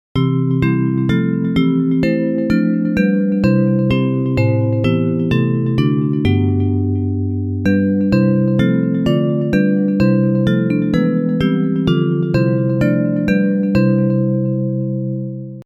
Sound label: Bells